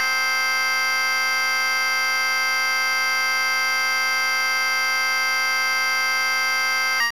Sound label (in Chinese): 手机音 C6; 音MAD; 蓝蓝路